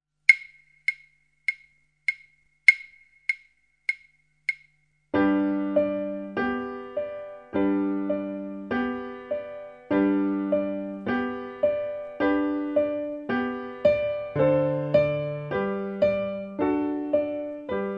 Arriba y abajo piano

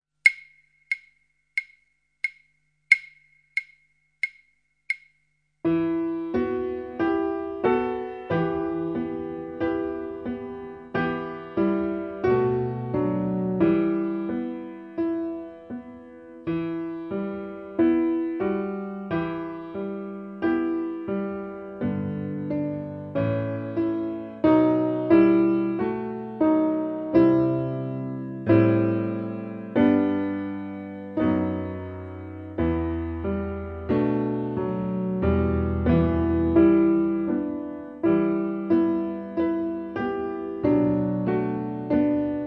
Luna llena piano

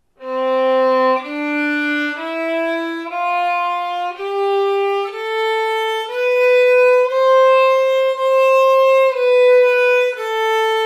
do escala; mi primer libro de escalas

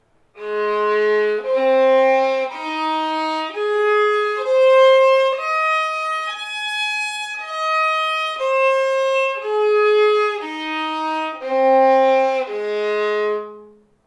la b arpegio; mi primer libro de escalas

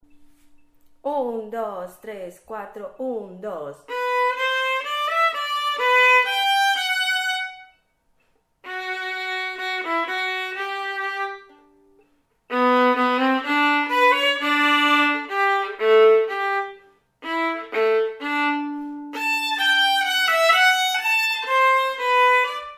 Gavotte voz

